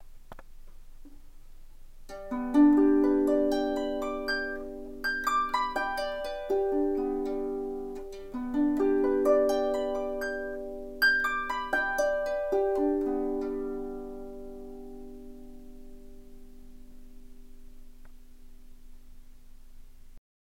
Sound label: Arpeggio, Harp; Esabelle